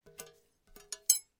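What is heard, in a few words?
knitting sounds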